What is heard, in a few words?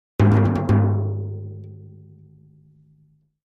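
Drums Toms B Shrt Battle, drums tom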